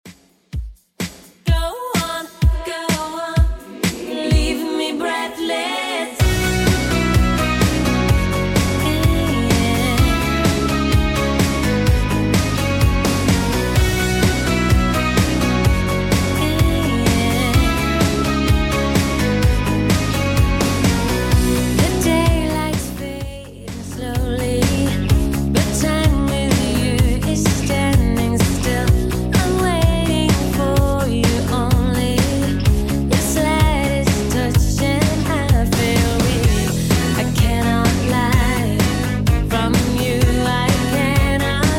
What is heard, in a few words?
127bpm